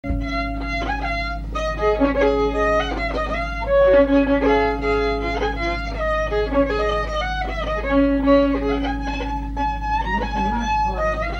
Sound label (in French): Mazurka; Martin; Les Loges-Marchis